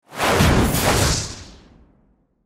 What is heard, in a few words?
cast power medium